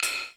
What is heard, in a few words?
FX Sounds, Drum Machines E-MU Pro-Cussion